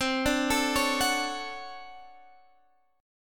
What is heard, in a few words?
D Major 7th, C; Dmaj7/C arpeggiated